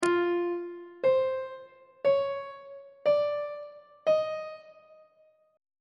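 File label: e sharp diminished 6 minor 6 major 6 augmented 6 intervals on treble clef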